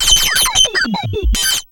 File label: sample hold, synths, dancerap; The Sample Bank